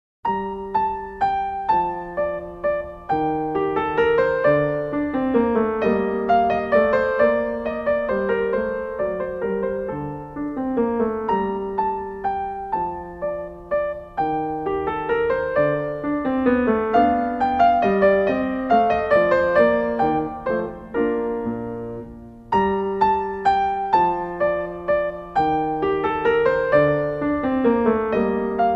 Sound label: Menuet In G Minor; Magrath Book 04